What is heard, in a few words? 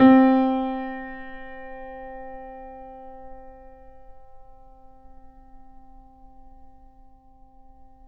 Mp C 6 off, Grand Piano off mic; AKAI S6000 CD-ROM - Volume 2